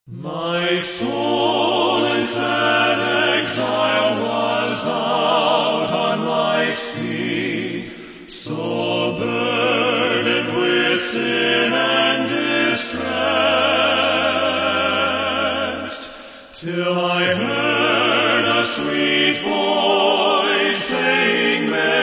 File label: Men's Quartet